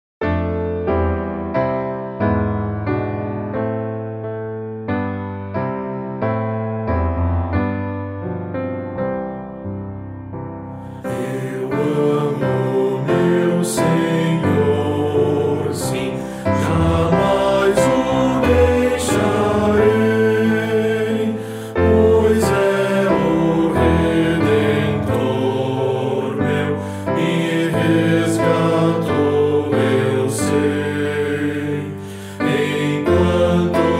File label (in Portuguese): voz piano